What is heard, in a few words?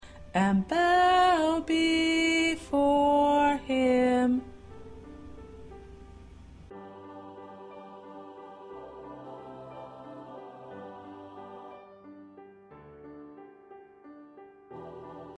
Lead, choir